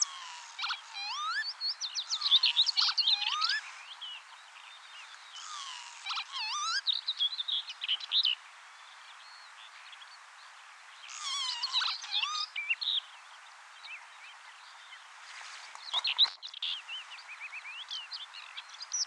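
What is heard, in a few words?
chibis golos, lapwing voice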